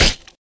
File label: nail body impact